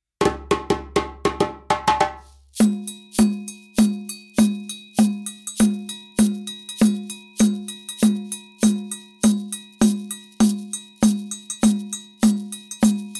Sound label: kuku kenkeni, rhythms